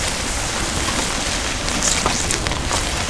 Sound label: GRASS